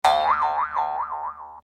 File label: boing boing bounce